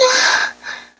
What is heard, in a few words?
Moan